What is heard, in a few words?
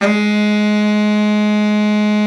BARITON, BARITON SAX